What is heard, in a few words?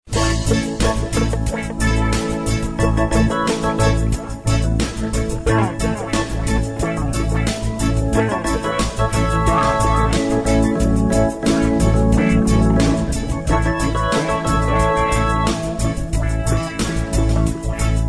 reggae music